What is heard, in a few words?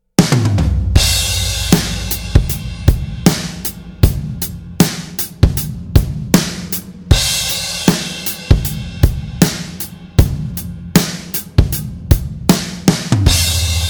Reverence